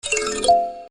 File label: Music Box